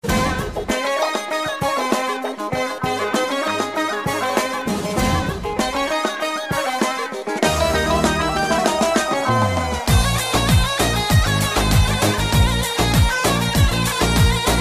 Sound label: Turkish Halay